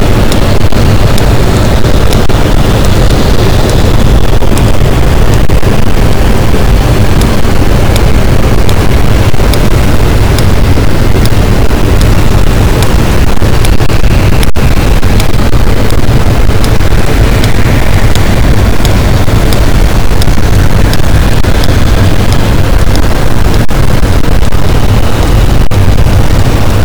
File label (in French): cachalot positif, boat, Physeter Macrocephalus